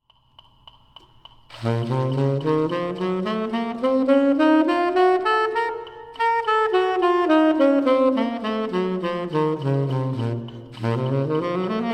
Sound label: Harmonic Minor Scales; Ian Frost; Centrum Audition Tape